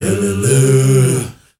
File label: MALE HUM, M. HUMMERS